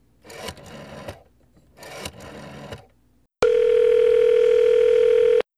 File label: Telephone Dialing; this is the zodiac speaking 2020 gamerip switch ps4 xbox one pc macos